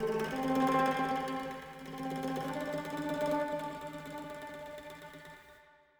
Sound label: ethno, 160, C